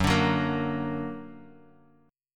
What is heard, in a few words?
F sharp Augmented F sharp; F#+ strummed